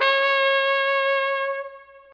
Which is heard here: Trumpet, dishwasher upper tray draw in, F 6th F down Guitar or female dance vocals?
Trumpet